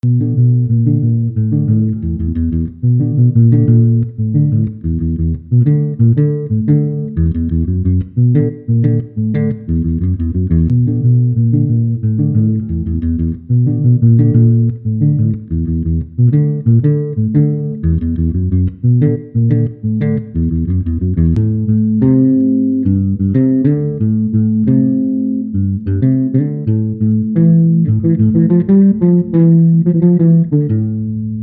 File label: Verdancy (Bassline)